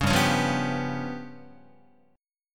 G 7th Flat 5th G down Guitar; strummed; JGuitar

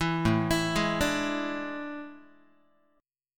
A Major 7th Suspended 4th, Guitar; AM7sus4; arpeggiated; 7,5,7,6,6,x

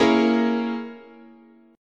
A sharp Minor 7th Flat 5th, guitar chord

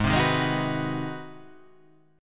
G sharp 7th Sharp 9th

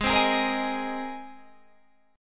G sharp Major Add 9th, Guitar Standard; strummed; 16,15,18,15,16,16

